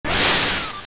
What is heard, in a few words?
Fan; A fan making a gust of wind; Zelda